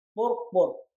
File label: clap boorrg boorrg